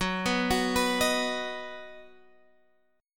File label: B-Major F sharp